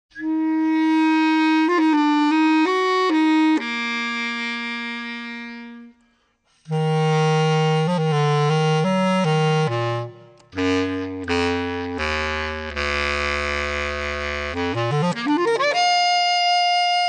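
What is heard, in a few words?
bass clarinet solo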